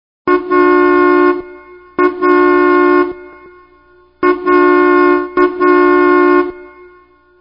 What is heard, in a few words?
Car Horn